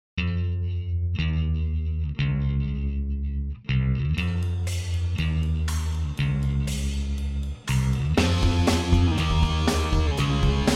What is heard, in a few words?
Pop Punk Instr